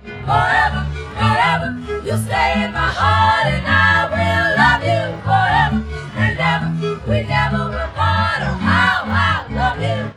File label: chorus 1&2; sound source separation; ADRess